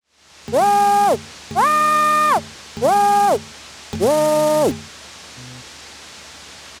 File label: media gap calibration, printer; zt231